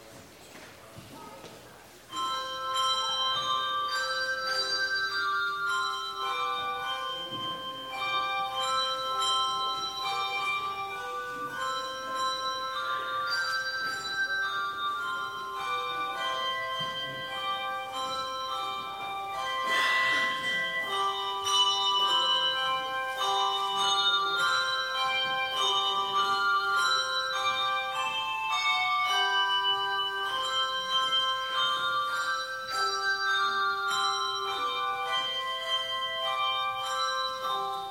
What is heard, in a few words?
Bell Prelude; Easter 6